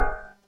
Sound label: alert